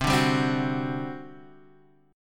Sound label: G Major 7th Suspended 4th, C